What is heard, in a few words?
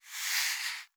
Soft Slide Medium